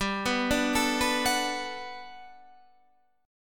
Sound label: G Major 9th, Guitar, Standard; arpeggiated